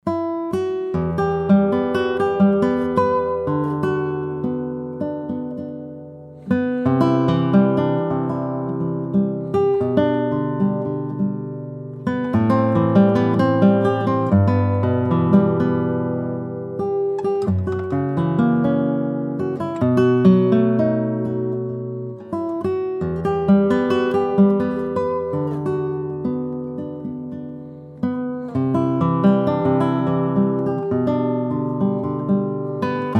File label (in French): guitare cedre ebene; amalio burguet; chateau dans le ciel